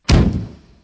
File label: thud